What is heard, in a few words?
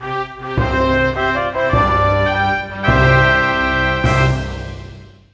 Country Manor, Fanfare; 1997 MMX LAN PACK (win 95); Worms Armageddon